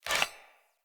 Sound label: Chop Entrance Axe Pull; Sinecure